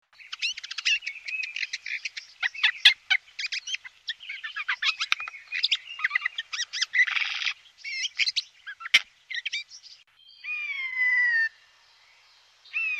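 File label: jay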